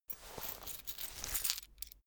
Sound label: key ring large pocket fetch